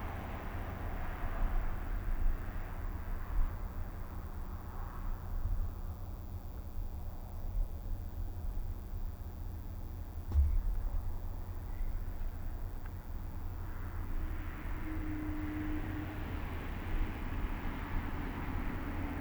train; Ambisonics; tetra

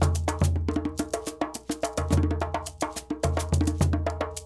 Djoun, Djembe, Shaker

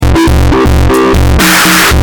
loop

